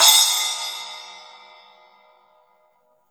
Drums Acoustic, RAW KIT; AKAI; 90s sample CD; USB Soundscan vol.10; RAW KIT 6

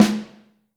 Vintage Snare Drum; Q Up Arts; Johnny.C's Vintage Snare Drum; 5.5X14

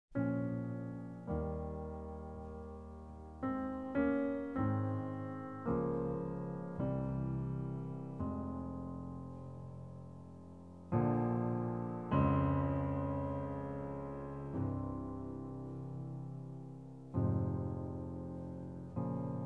Hymn; Fourth Way

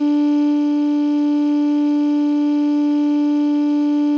CALLIOPE D2; Sonic Reality; American Heartland

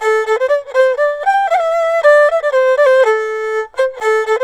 ERHU; INIS; Opium

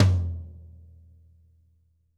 tom, drum

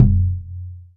SuBasso Drum; Multisamples; Digital Sound Factory Planet Earth

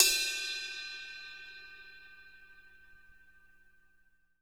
CRASH BELL

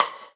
GATE RIM, Drums Acoustic; ALL RIM; USB Soundscan vol.10; AKAI; 90s sample CD

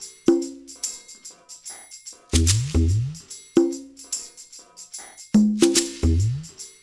LOOP, Percussion; InVision Interactive; Percussion Slam; KWICKIE

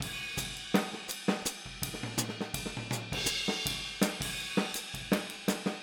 Boogaloo Fill Hi-Hat, 82 BPM; Sonic Foundry; Drums from the Big Room The Mixes

